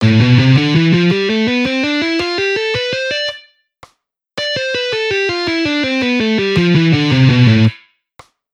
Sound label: Hammer ons, guitar